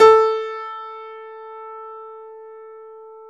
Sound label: A3 YAMAHA R, GRAND; NS GRAND